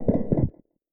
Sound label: item glued